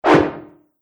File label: Arcade Game Rubber Sweep; Video Game Sound Effects; Retro Inspired Sound Effects - Think Nintendo, Atari, C64